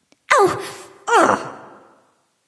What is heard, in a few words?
ouch; smite; voicepack; nike